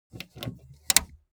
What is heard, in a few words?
transportation car bmw e46 exterior gas cap open short